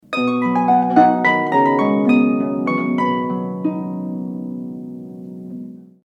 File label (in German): Harfe